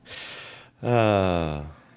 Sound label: deep breath and sigh